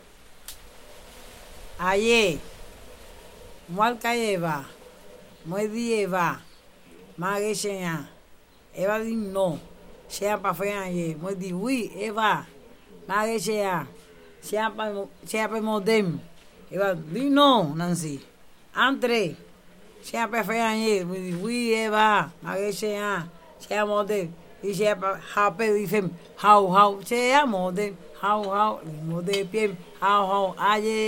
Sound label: merengue